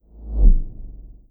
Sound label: Whoosh Object Pass Small Right